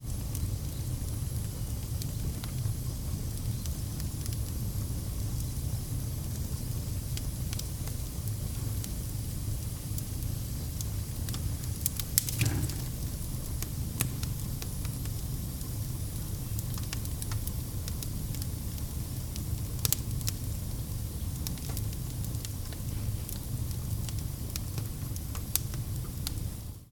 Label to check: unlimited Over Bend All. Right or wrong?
Wrong